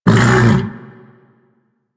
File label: Vocal Tranq Grunt, critter; Warframe; Hunting Critters; Undazoa